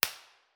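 summer site 2 1 way mono, impulse response, Koli national park summer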